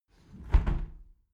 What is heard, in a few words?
window close tilt soft sequence